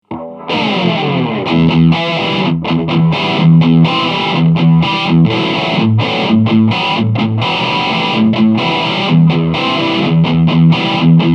Harley Benton JamBox Metal ohne Effekte

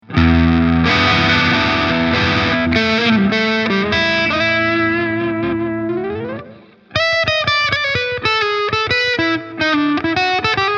Hals Verzerrt bluesy

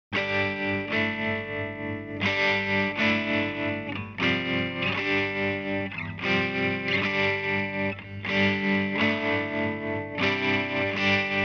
Kanal lauter Tremolo

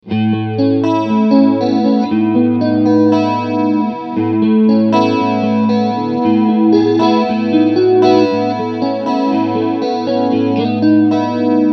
Chorus Rate 9 Uhr

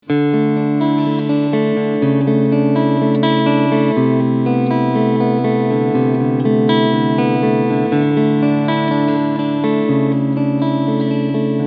FGN Boundary Iliad Cherry Sunburst Cleansound Front Humbucker SC Modus

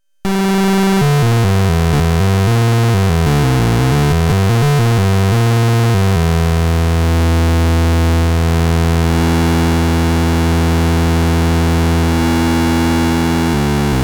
Sequencer als Wavetable Oszillator

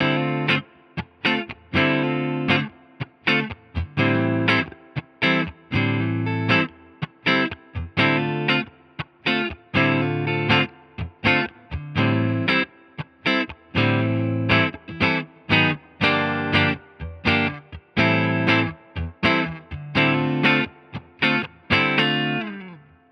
Floorboards im Vergleich Clean Fender Tone Master Pro

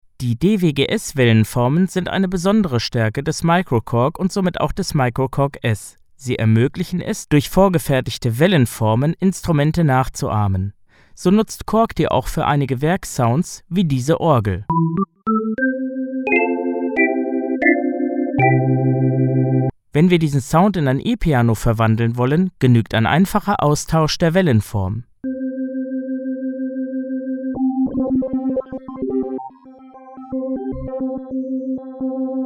Beispiel zu den DWGS Wellenformen

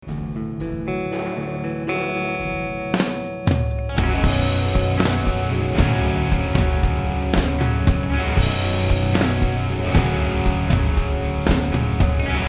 moderates mastering

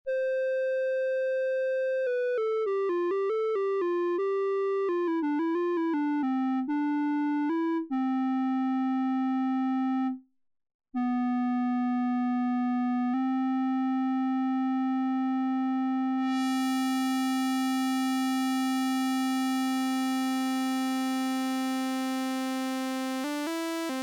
MicroFreak Soundbeispiel 4 Basic Waves und Sub Oszillator

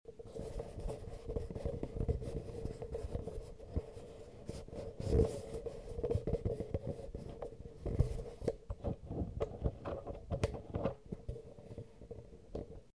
Tascam DR-44WLB Handgeraeusche

